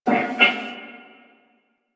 Wolf Breath; Animals; DE; Warframe; Quests; Duviri

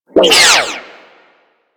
Energy Projectile By; Corpus

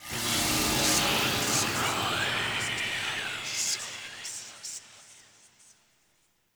Puzzle Mural Hint; Puzzles; Circade

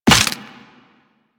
Run Bone, Footsteps; Warframe; Operator

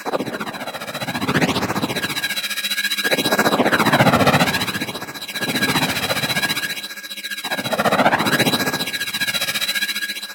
Arch Wing Movement Choppy Loop, Vehicles; Warframe

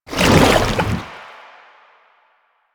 Chem Strike Goo Layer, Foley; Enemies; Soldiers; Footsteps; Warframe; Lasrian; Nox

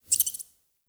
Witch Station Rat Chatter; Ambience; Soul Plane

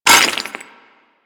Melee Impact Cement; Warframe; Weapons

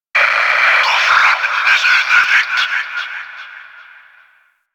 Fortress Exterior Notification; Ambience; Warframe; Grineer Fortress

